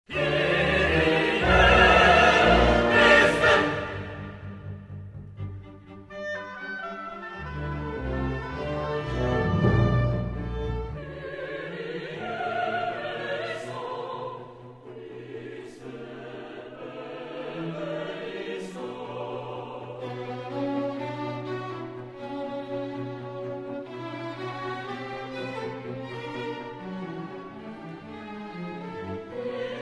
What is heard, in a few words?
Missa Kyrie